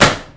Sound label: Book Dropping